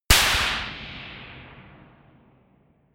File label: gun slapping pop gun